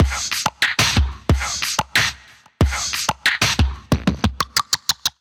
Snappy Vowelizer Kit 92 bpm, percussion; Ableton; Factory Packs; Voice Box

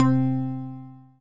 Mallet, wavetable; Instruments; Mallets; Rich Mallet; Ableton; Synth Essentials

